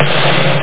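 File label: snare drum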